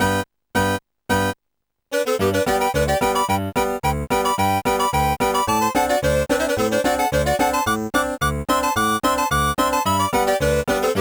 clarinet polka; Deluxe Music Construction Set

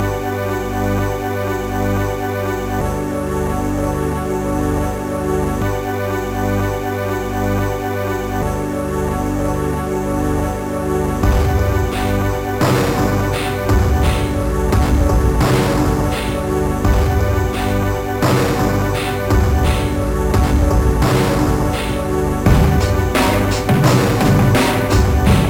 ambient groove; Hardraver; Trackers; Modland

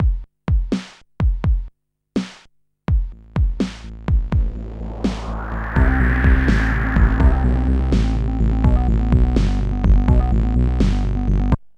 echo; MusicLine

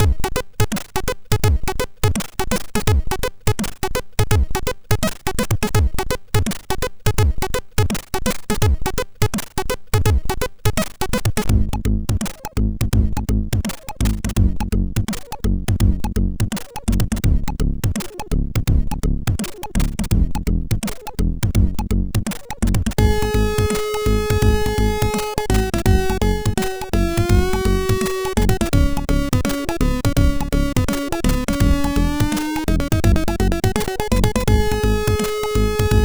Funky, MOS6581R4

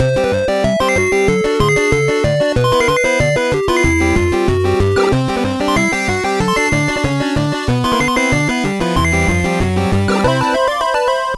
gameboy jingle

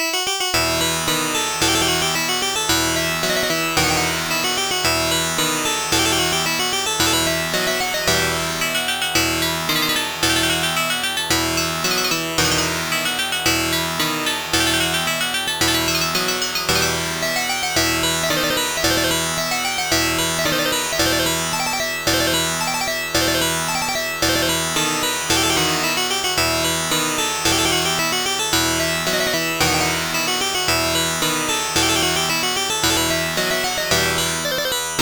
Tambourin, Harpsichord; Example Modules

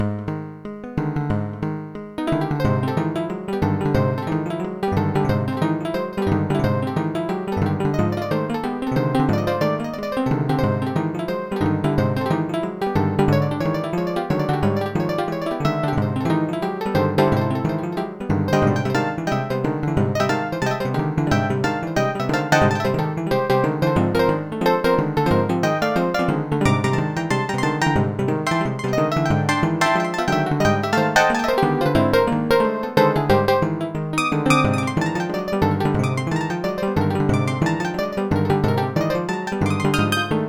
boogie woogie; Master Tracks Pro; mt 32

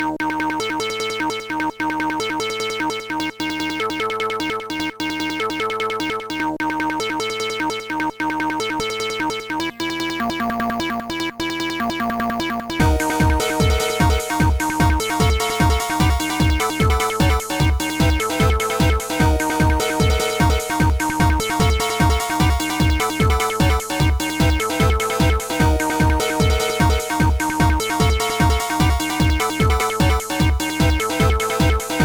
tiny acid, chiptune

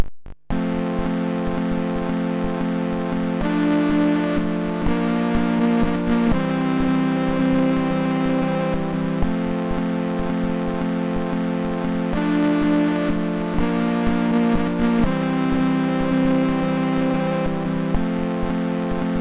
fnord chord; MusicLine

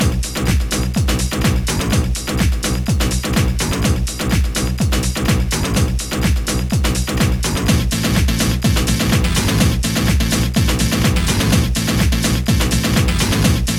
mod techno beat box, Trackers; Modland

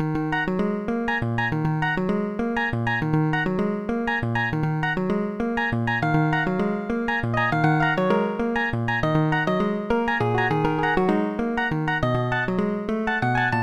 five four; The Music Studio

